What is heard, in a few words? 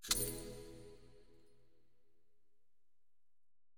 spin Button Quick Stop; fairyhansel